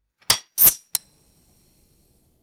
aluminum beverage can opening and fizzing; foley

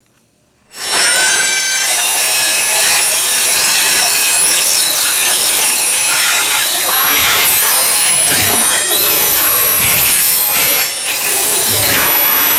power saw grinding through stucco wall; tools

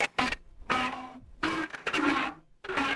ring modulated synthetic creature beeps; creatures

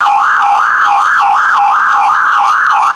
fast oscillating police siren; vehicles

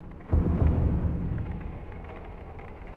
deep organic creaking burst and rumble; creatures

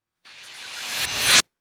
short synthesized reverse hissy bursts; magic-scifi

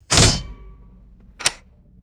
mechanical punch clock engaging and releasing; clocks

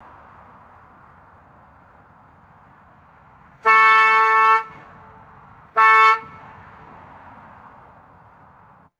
distant car horn honking multiple times; vehicles

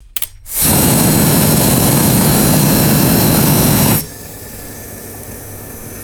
welding torch ignites and hisses; fire

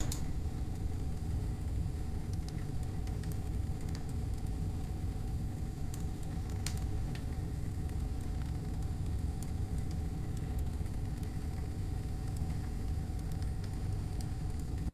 steady wood stove fire crackling

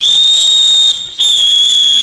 two short steam locomotive whistle blasts; trains

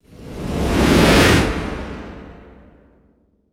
fast airy synthesized transition whoosh

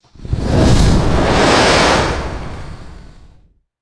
boomy fire flare ignites in metal container

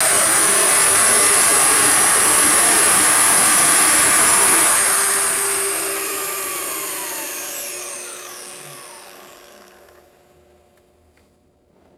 vacuum cleaner motor spinning down to stop; machines

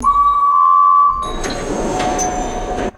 subway door warning chime and closing; trains

